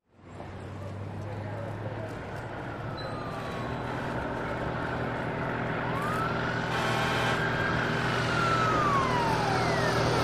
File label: Fire Truck Close By With Siren And City Ambience